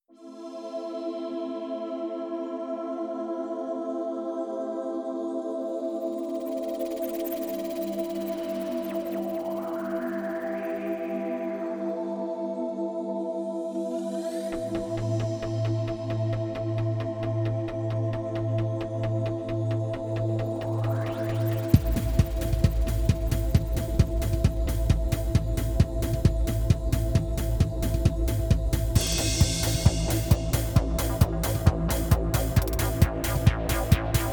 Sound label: elektone